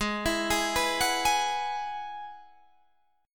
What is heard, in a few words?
G sharp Minor 7th Sharp 5th G sharp down; G#m7#5 arpeggiated